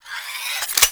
Pistol Skin Reload Spin Stop; Warframe; Weapons; Capstan Pistol Skin

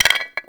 Clay Pot Hit Drop; Warframe; Awakening Remaster

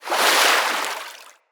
Hoverboard Terrain Caster Fluid; Vehicles; Venus

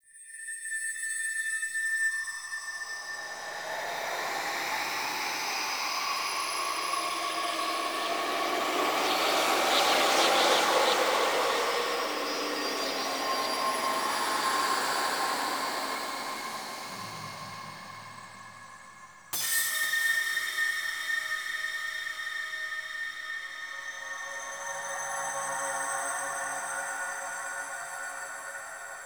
Whispers; Warframe; The War Within; Mirror; Cinematics

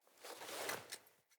Foley Action Default Sword Equip

